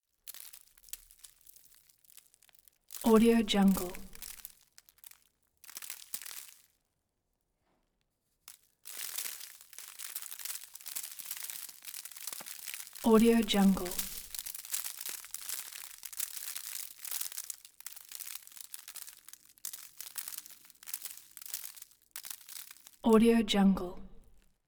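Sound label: hail or sleet fall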